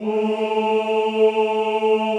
CHO A2, Ooh

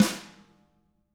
tama hit; ILIO; Double Platinum Drums 1

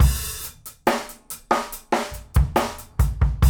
GROOVE, 60-85bpm

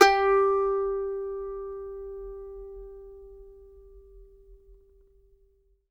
MANDO NOTES, G4; Sampleheads; Whole Lotta Country 1 & 2

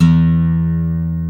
GUI Ac. E2, GUIT AC; Best Service Gigapack 2

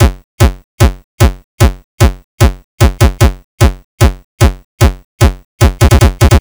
C64 Kick Drum Loop, chiptune; Commodore 64

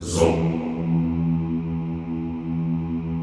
ZOOMMM E1, Classical Choir; Best Service; Extended Classical Choir

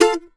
MANDO F MAJ L; Sampleheads; Whole Lotta Country 1 & 2

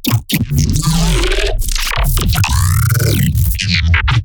glitch stab; Virtual Riot; Glitch Stabs

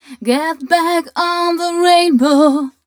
f mel d, fem Vocals

